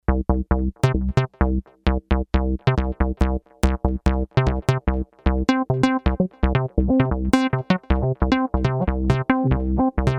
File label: Silver SQR